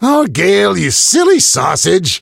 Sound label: hurt